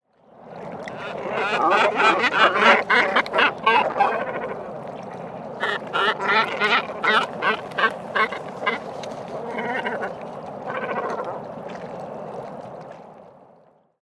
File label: Great Cormorant Phalacrocorax carbo sinensis; waterfowl